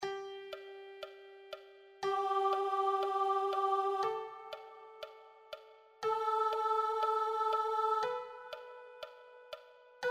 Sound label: Pitch Match